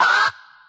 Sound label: "ah"